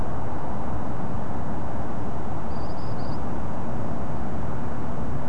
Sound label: Ashy Minivet single call, nocturnal flight call; nocturnal flight calls (NFCs)